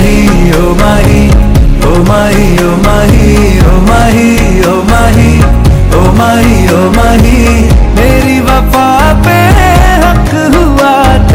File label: new movie ringtone, bollywood